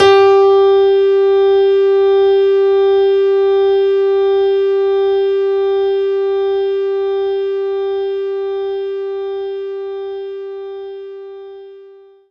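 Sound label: Grand Piano F sharp 4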